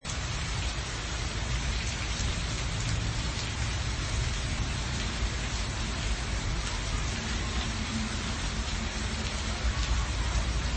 Tieng mua roi ti tach

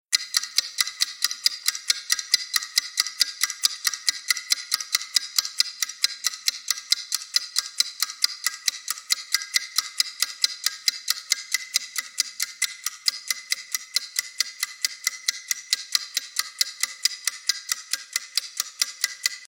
Am thanh het gio

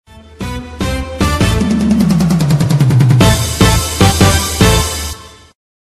nhac chao organ hello music ngan

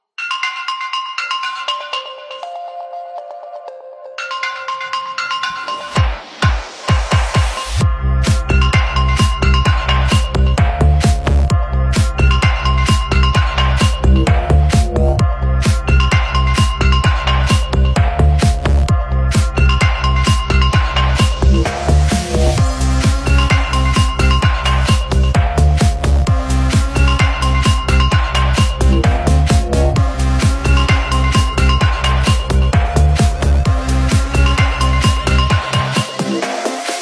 Nhac chuong Vsmart remix

